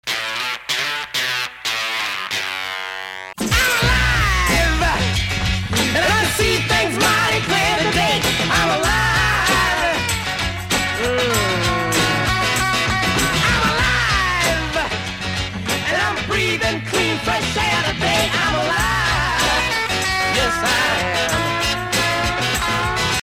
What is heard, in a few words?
samsung galaxy s6 ringtone